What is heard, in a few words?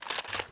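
Inspect Movement; valorant; rgx 11z pro vandal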